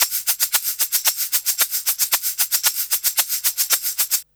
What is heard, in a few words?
PERC R, Roots Disco; USB Soundscan vol.32; AKAI